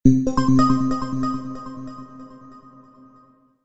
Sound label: vote